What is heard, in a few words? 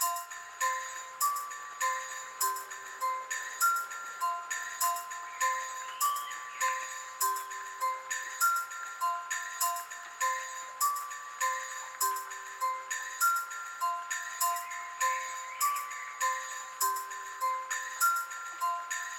Music; MiSide